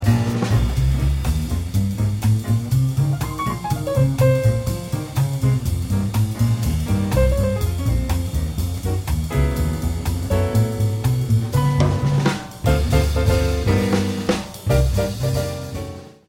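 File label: rhythm section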